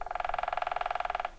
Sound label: woodpecker